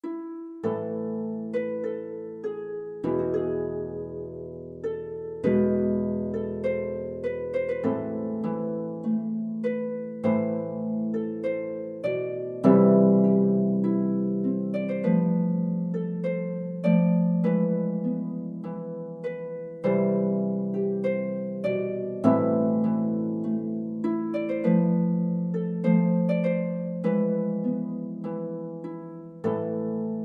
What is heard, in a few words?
HARP